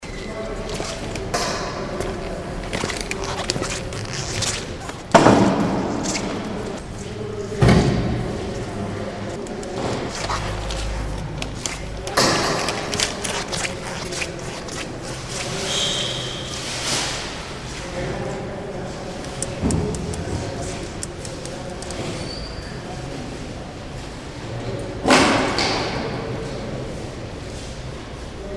Basilica Interior; Basilica Merci

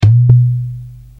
Tambourines Sound

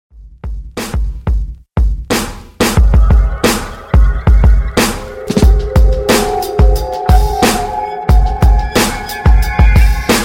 Hip Hop Beats

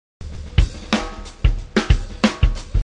Hip Hop

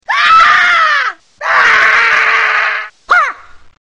Fighting cry

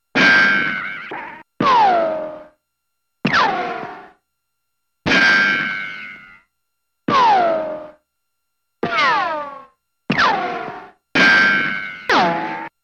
Bounce Effect

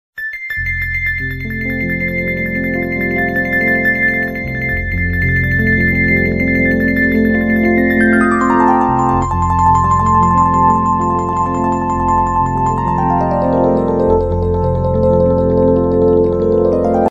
Nature Music

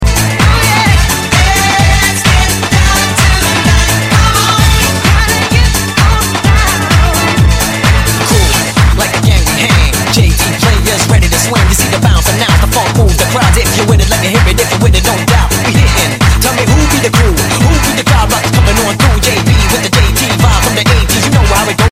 Dj Mix

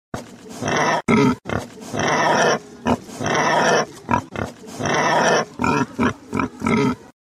Funny Pig Sound Animal

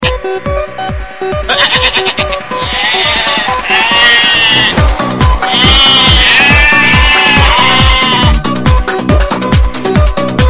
Funny Sheep Remix

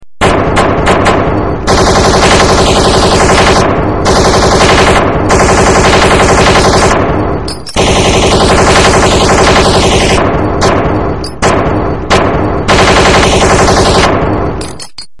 Metal Weapons

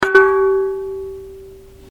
Ting Ting

